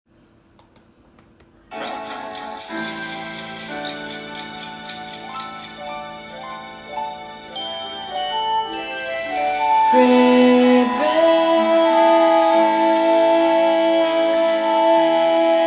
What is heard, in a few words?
Bass; Fame The Musical - Holiday Pack